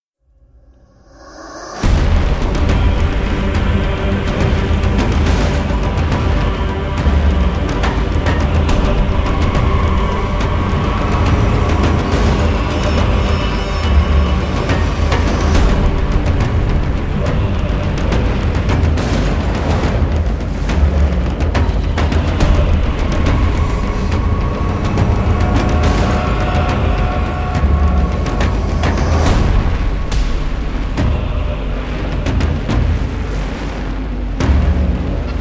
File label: Drums; Drums from Hell